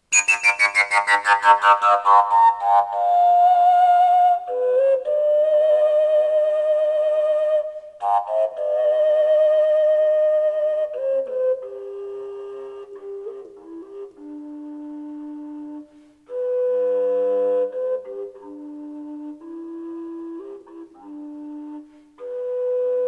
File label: g acid painted, fujara; folkart slovakia